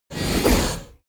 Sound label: sickle swing